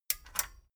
metal button stereo click disable medium

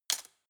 cutlery plastic drawer place

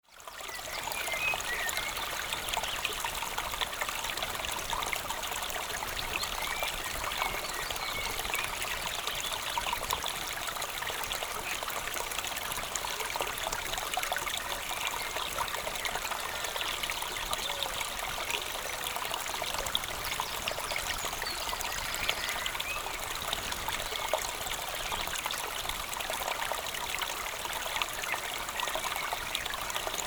creek purling birds in background

